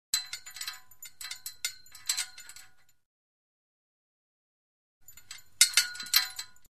Flag Pole Metal Clanks; Hollywood Edge Foley Sound Library

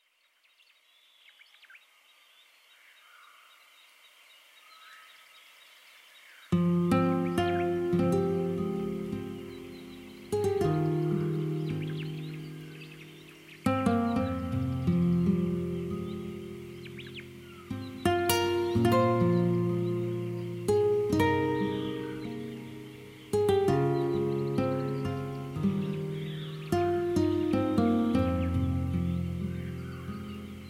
Amazon rainforest; Dan Gibson & Michael Maxwell

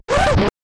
scratch

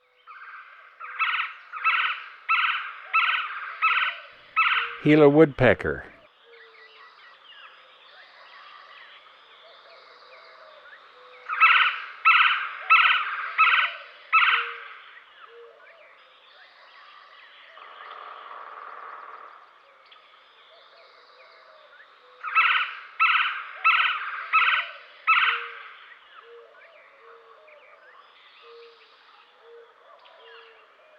Gila Woodpecker; Western North America; Dan Gibson; Wildlife Identification By Sound

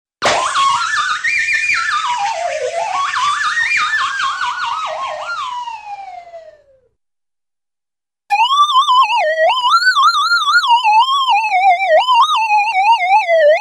Balloon Pop & Funny Air Release Whistley Tone; Hollywood Edge Cartoon Trax

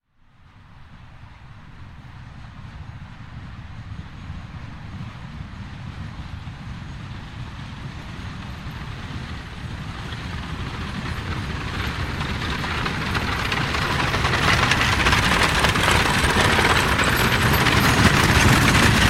Horse Drawn Carriage And 4 Wag; Horses Carriages and Traps; Hollywood Edge Historical Series

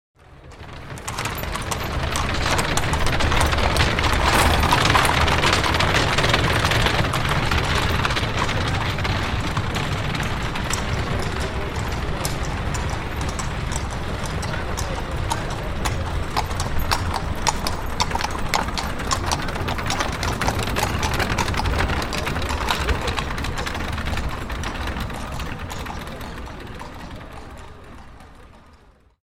Many Horse Drawn Carriages By; Horses Carriages and Traps; Hollywood Edge Historical Series

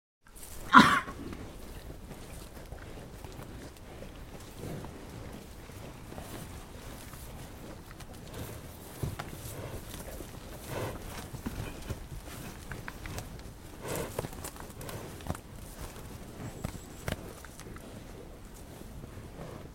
Coughing Cows Cows Milling In; Hollywood Edge Historical Series; Traffic Free BG's & FX

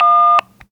phone; 1000fx; OFFICE

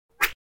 Swish Electronic Swish; Crashes Impacts

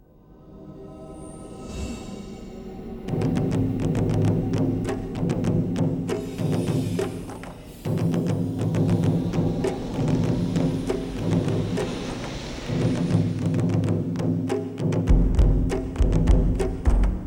Taiko; Cirque Du Soleil; Journey of Man - Soundtrack Album

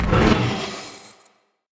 whoosh; Whooshes and sweeps

